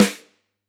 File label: close Rim, drum